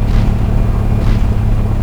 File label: mech medium, vehicles